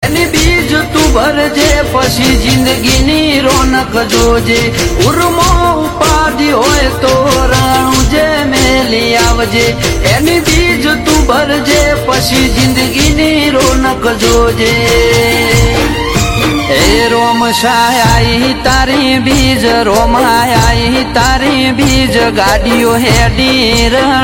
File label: Bhajan Gujarati Rington; Ramapir